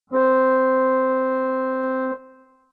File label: organ interval